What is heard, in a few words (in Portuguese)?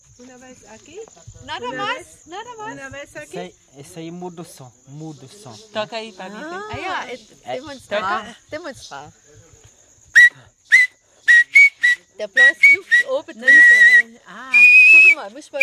timbiras